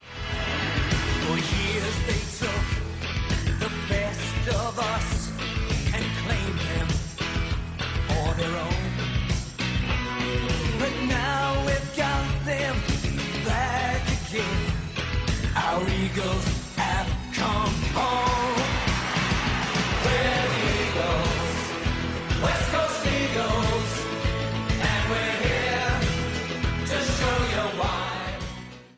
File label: west coast old ringtone